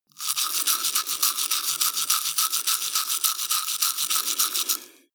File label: teeth brush dry open circulate